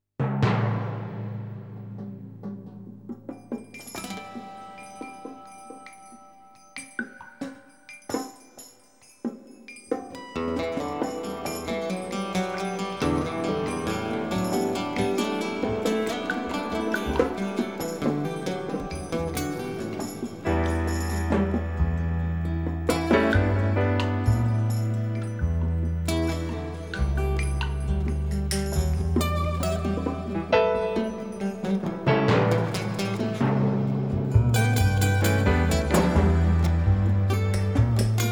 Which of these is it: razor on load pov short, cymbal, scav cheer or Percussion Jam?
Percussion Jam